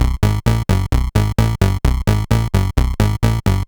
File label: Sh101 Loop, 130BPM